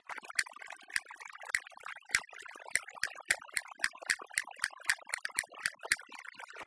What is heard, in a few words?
Castanets Violin Applause